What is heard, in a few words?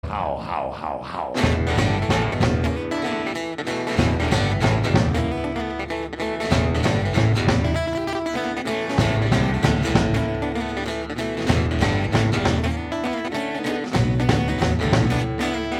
boom